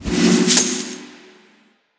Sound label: coin Win